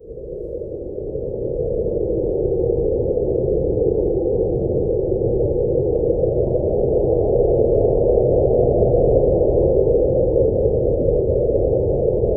light desert wind ambienc

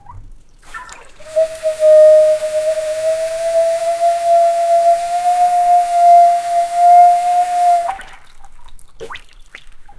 hippo blowing water

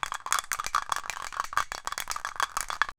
sound of three sharp shak, art supplies

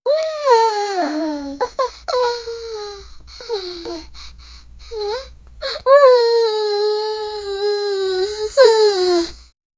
female

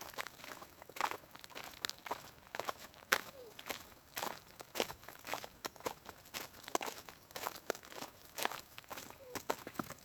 a person is running in for; human movement

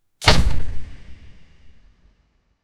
player taking damage

